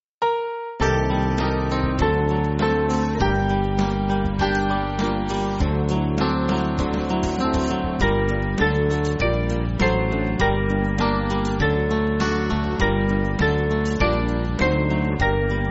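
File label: Band, Backing Files for Churches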